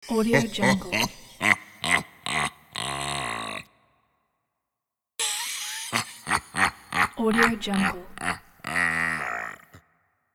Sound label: evil laughs